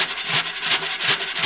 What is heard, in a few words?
train, toy